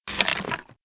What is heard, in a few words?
Skeleton Ghost Attack; Playable Tutorial Level